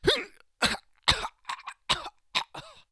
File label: daemon die; propack; magic